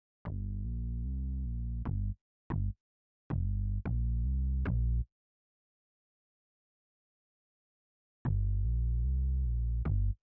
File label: Deep Round Synth Bass